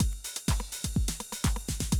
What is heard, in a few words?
125, Classic Drum Loops